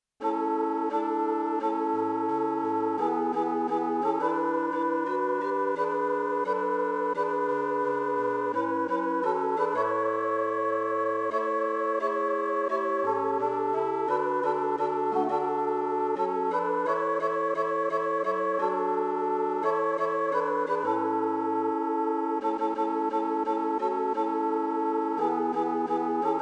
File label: soprano